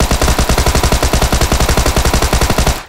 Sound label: tommygun; bobritto banditto